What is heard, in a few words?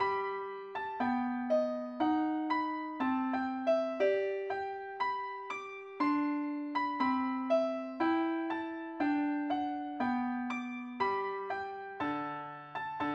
mandolin, key g; pilot